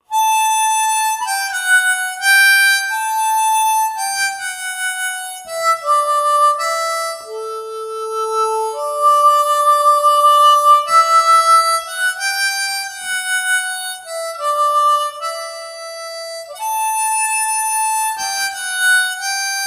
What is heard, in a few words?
harp